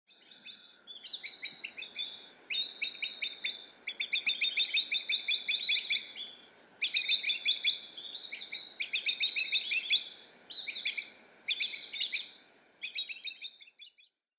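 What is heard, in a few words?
Green Sandpiper; kusashigi; watarase; koe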